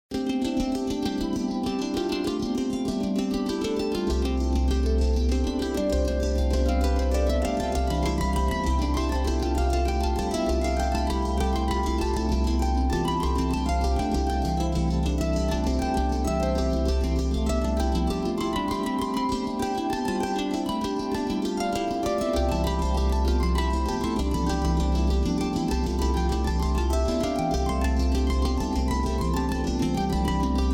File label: Enchanted Harp